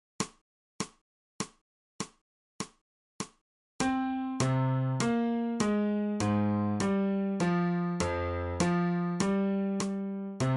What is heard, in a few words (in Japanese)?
新フレットレス